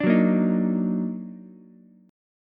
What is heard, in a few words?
D sharp Minor Major 7th, Ukulele; strummed